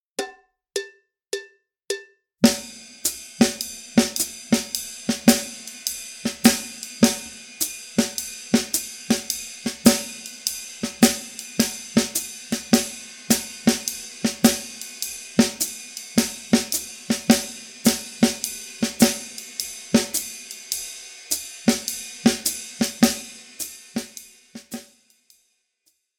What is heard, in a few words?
Comping, Jazz Latin; Schwarzes Album; Bum Bum Tschack